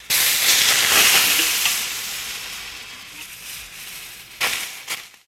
Fizz Sizzle Long, fuse

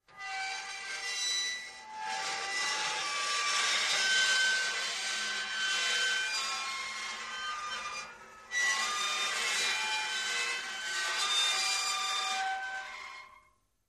Slowed Down Harsh Metal Screeches For Train Brake

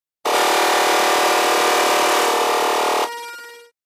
Noise HF Elect Drone S

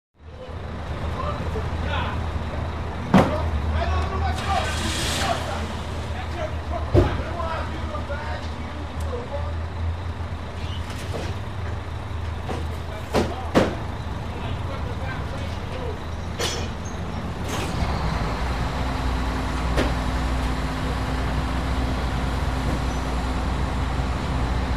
Garbage Truck Close

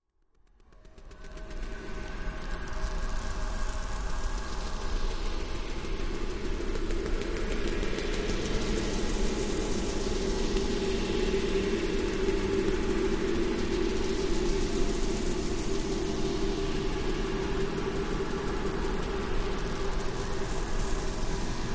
Bent Wind Dist Elect Win, eerie ambience

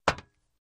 Metal Loafer Left, footsteps metal

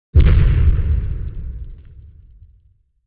Explo Echoey Hollow; explosions

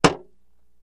Mallet Hits Wood Shield

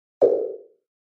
Pop Up Muffled Hollow, pop up element

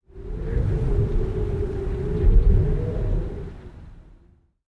wind gust; ambiences

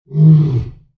Lion Roar Single Call; big cats

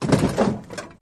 Auto Crash Sml Side S, car crashes

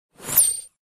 Button Thin Turn On

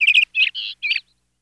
Canary Call, Chirp

